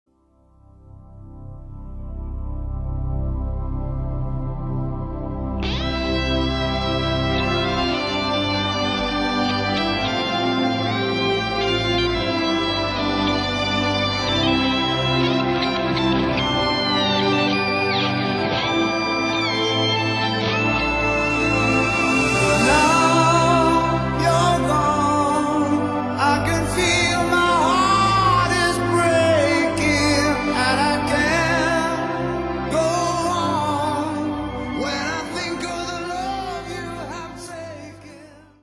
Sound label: Music